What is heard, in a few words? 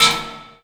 Oneshot; Sonic Foundry (Sony Creative Software); Esoterik Beatz